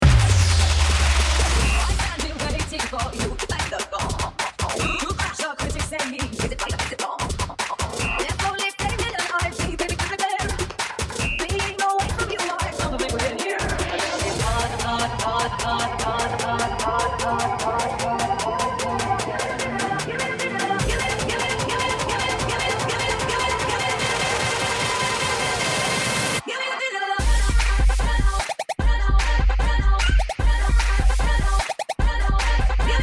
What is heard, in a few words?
cheer mix; wendell middle school